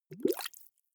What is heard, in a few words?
bubbles soft